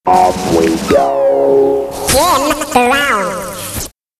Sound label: Jingles